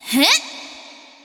attack; undead

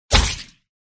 sound attack hit; hitsound

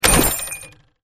merchant

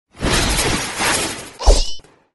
skill bullet; sakura

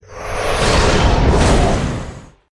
sound attack hit; hitsound

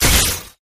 skill bullet

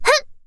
attack; bleachedbones

